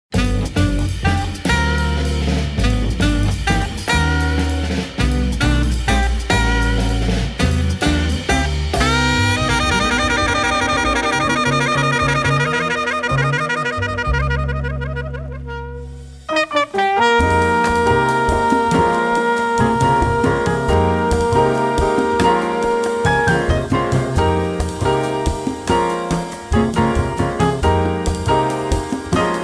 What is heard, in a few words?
jazz